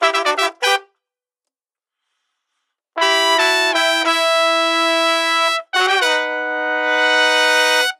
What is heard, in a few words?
Realbrass FL2 Mix